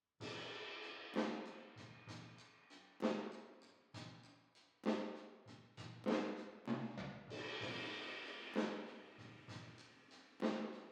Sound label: Drums Verb